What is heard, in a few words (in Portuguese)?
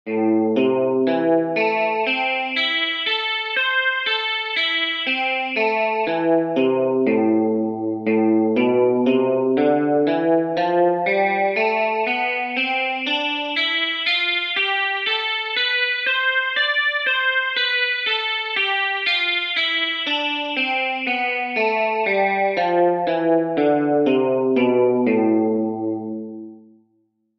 Exercícios Guitarra 60 bpm